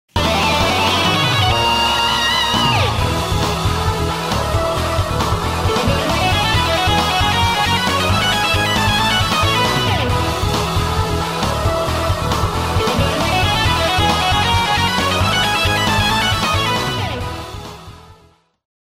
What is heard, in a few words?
Match Victory Theme; En Eins Perfektewelt; arcade windows